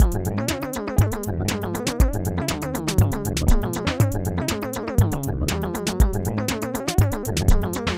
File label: Temple Dojo E - Cartoon